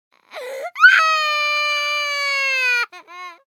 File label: BABIES CRY Girl 24 months Short; Baby Boom